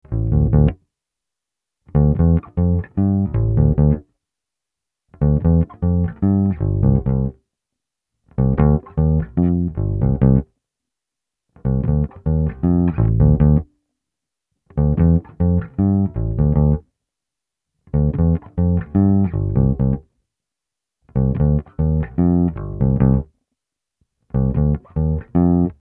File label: D to C bass; admiralbob77